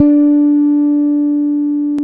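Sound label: Bass Electric Full D4; CMI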